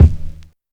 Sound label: small reverb kickdrum sample D# key